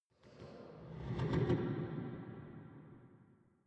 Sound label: small impacts